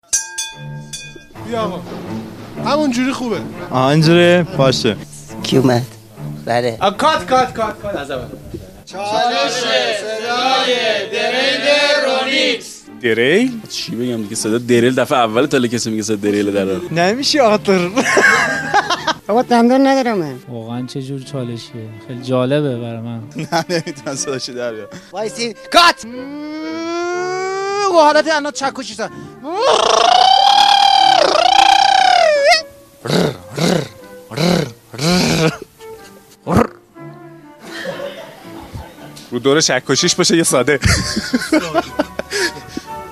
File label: Ronix drill